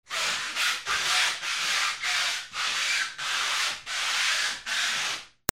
FRICTION

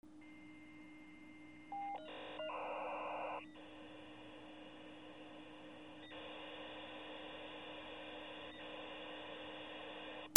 PHONES

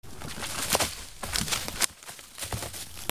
FALLING

